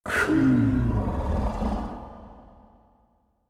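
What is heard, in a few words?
monster die roar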